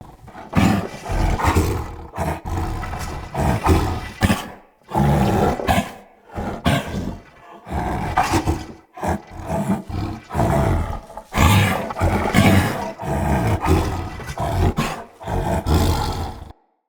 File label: Corrupted Battle Dog Attack Breathe Snarl Run Loop, Animals, Vocals